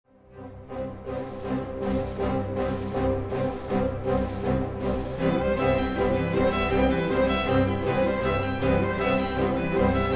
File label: Double Concerto for Violin Cello and Orchestra